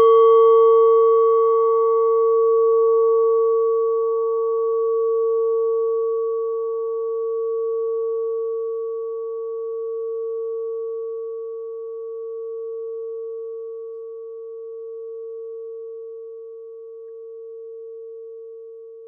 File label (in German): klangschale nepal, Klangschalen Nepal